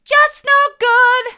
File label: just no good mid, vocal